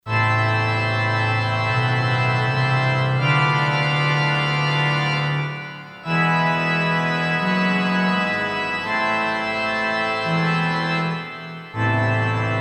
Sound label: PIPE Org; Korg DSS-1; Harpsichord & Organ